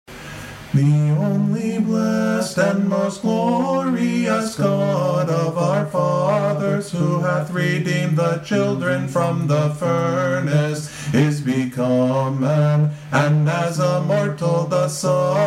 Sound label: paschal canon ode